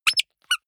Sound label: squeaker squeeze with release hard